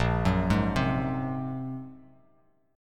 A sharp 7th Suspended 2nd Sharp 5th, Bass Standard; A#7sus2#5 arpeggiated; JGuitar